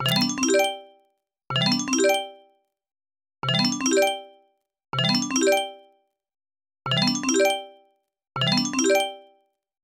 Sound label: Japan EAS Alarm